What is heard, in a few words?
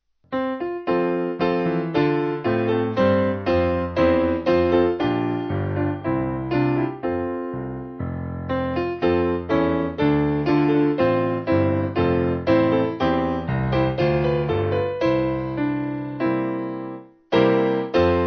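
Piano, church music backing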